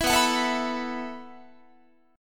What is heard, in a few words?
B 7th Suspended 4th B down Guitar